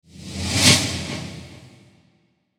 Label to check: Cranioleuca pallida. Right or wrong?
Wrong